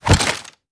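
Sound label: melee attack gun large; viper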